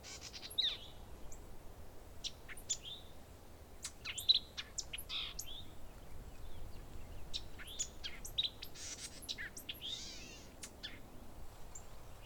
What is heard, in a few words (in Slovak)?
vtaci